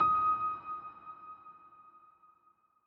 piano note; beacon pines

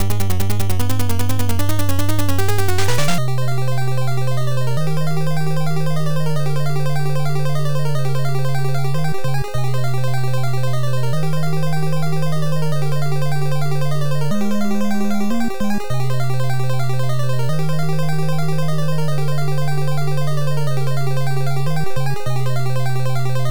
Boss Battle BGM; crackout ea nes gamerip 1991

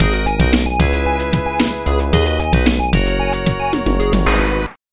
Jingle - Game Over; Game Over From Cosmic Carnage; Metal Sonic Rebooted; genesis

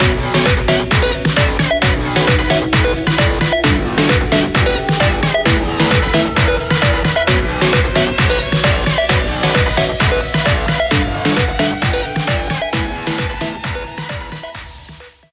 Stinger; Champions Field

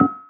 Stack Place; Welcome to the Game II

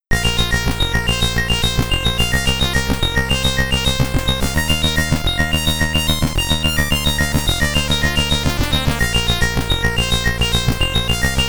Stage Clear, SID Stereo; Cowabunga Dude!

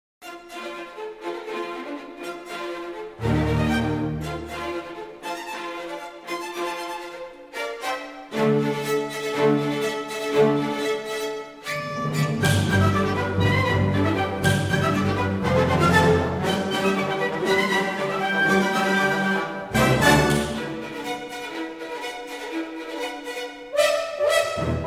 BGM Orchestral

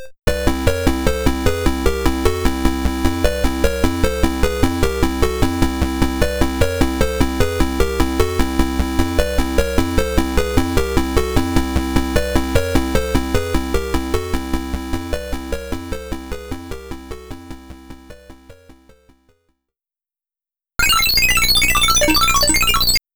BGM ~ Stage Clear

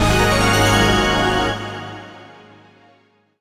Unlock Jingle; Tatsunoko vs. Capcom Cross Generation of Heroes

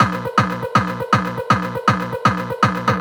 Beats Idle; Anger Foot Demo; Angerfoot Beats 05 Idle V2

